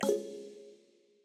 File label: new battle in list